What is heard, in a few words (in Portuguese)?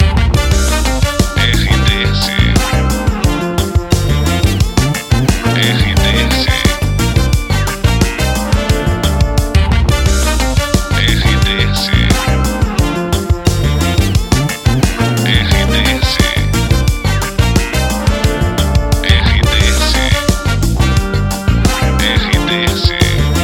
trilha para locução educativa; Inatel; Difusora FM, Nortelândia/MT